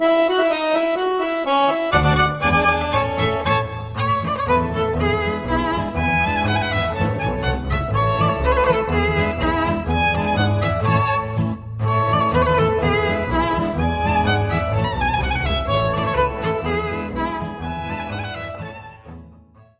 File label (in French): Pariser Polka, Norvege